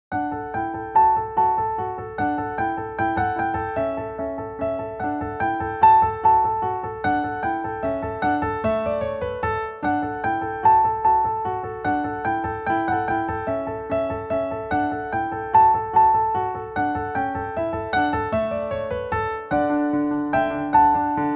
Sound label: Two German Dances in 3 2